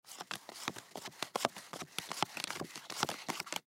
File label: Cards Deal Around Poker Table; Casinos; Cards Deal Around Poker Table Fast; Sports; Blastwave